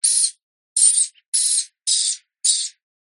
animals bird high pitched squawk

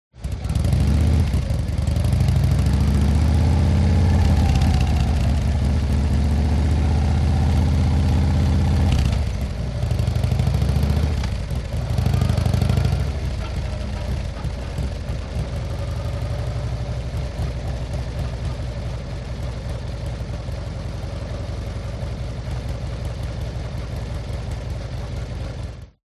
MOTORCYCLE Harley Accelerate Soft; TRANSPORTATION; smartsound

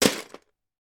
toy lego blocks put in full box; sound effects one

